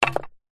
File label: stick small drop on paving stone; foley; zapsplat